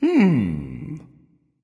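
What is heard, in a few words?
thinking, nonverbal; smite; voicepack; khepri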